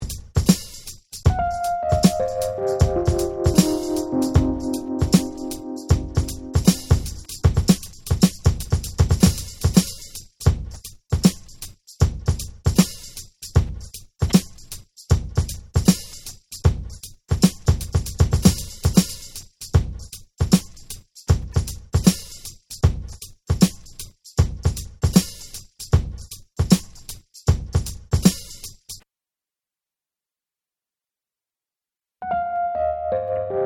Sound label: Piano Groove